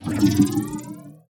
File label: Pull; Heartball